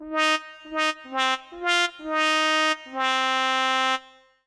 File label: Sarcastic, Fanfare; 1997 MMX LAN PACK (win 95); Worms Armageddon